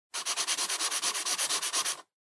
Pencil Fill

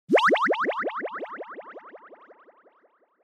Motion Pop

